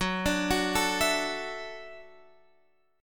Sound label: Gb Minor 7th Sharp 5th, Guitar, Standard; arpeggiated; JGuitar